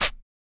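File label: PERC SHAKER, MALE PERC; Spectrasonics; Vocal Planet; Jazz FX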